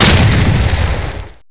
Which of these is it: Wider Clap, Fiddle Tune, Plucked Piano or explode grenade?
explode grenade